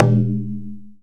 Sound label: DAIKOKU, JAPANESE PERCUSSION; AKAI S6000 CD-ROM - Volume 5; Ethic